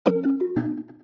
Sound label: Notification; Samsung Galaxy A25